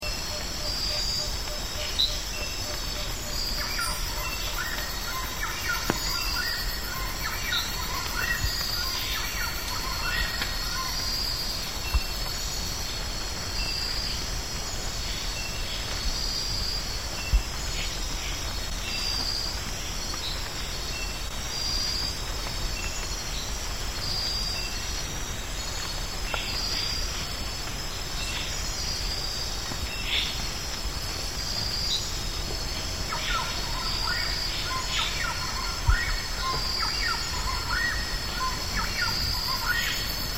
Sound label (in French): kamichi a collier, chant